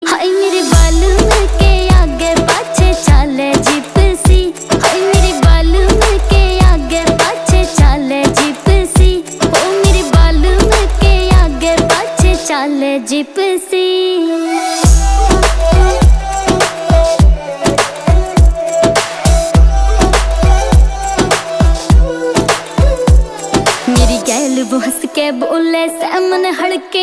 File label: Ringtone